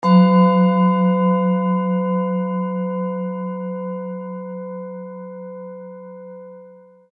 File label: tib bowl jupiterset